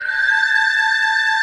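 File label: ambient pad, synthesizer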